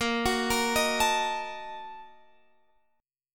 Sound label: Bb Major 7th Suspended 4th Sharp 5th, Guitar, Drop D; arpeggiated; 20,21,20,20,22,x; BbM7sus4#5